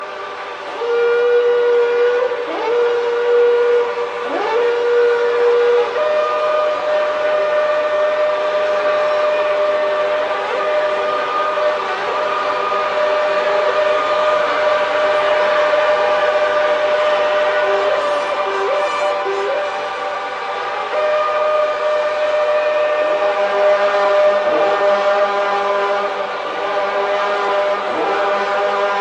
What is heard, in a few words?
Shofar